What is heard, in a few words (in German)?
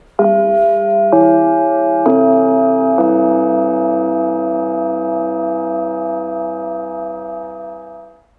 Pausen-Gong